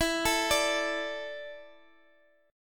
E 7th Suspended 4th E down Guitar DADGAD

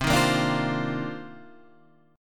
C Minor 11th C Guitar Standard; strummed; JGuitar

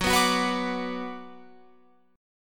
Gb Suspended 4th Sharp 5th, Guitar, Open F; strummed

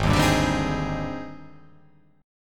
G sharp Major Sharp 11th; 4,6,5,7,7,7,5,5

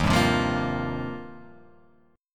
D sharp 6th Add 9th, D sharp, down

